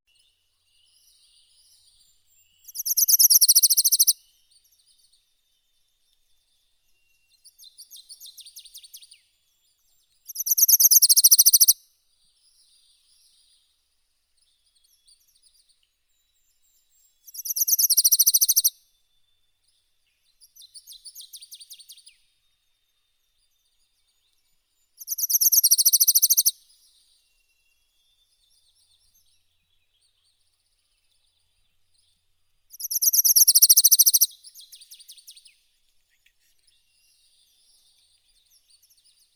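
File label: Wilson's warbler, Wilsonia pusilla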